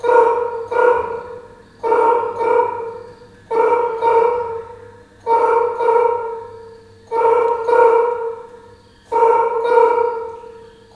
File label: DOVECALL, Heart of Africa, BIRDMEN; Spectrasonics